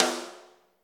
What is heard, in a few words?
Prac Snare Rimshot